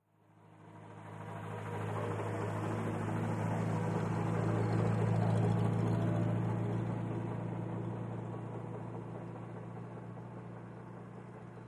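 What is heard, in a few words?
Buick, In Slow, By close up, Away Slow, antiques; 1938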